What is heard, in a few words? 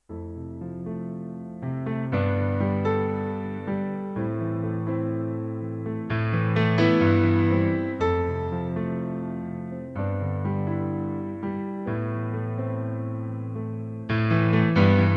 level piano